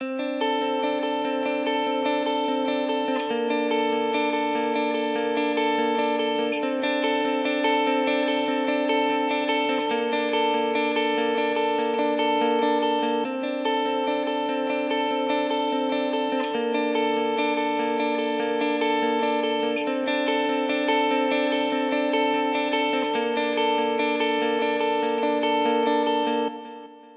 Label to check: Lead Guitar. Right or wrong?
Right